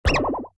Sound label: Mouse Die